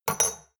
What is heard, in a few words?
shot glasses pull out hard, gastronomie; berufe; soundpool